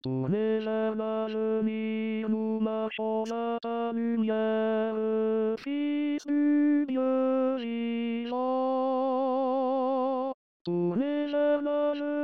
tenor, chorale

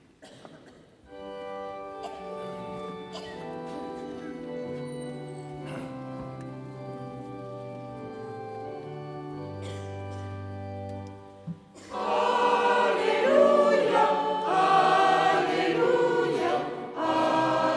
Alléluia, chorale; de Saint Augustin; l Epiphanie; Levroux

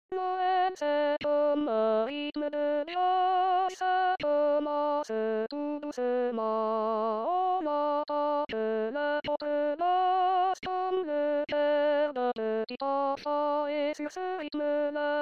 noel jazz femmes aigu

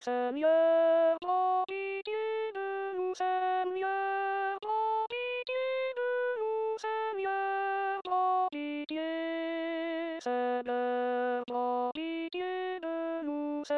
kyrie messe de la trinite alto

